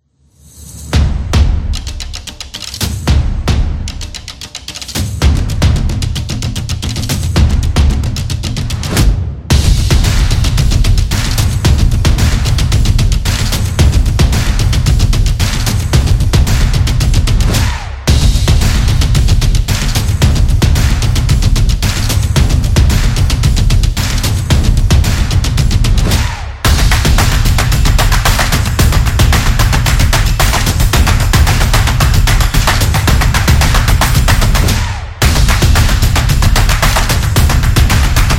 The Drums Intro; sports beat

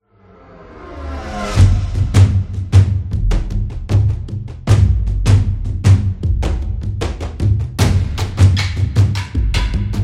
Tribal Epic Drums

